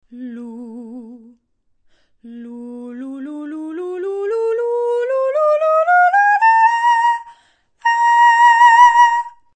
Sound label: scale; singers